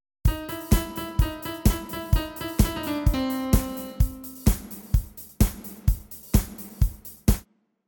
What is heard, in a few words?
Cider Pad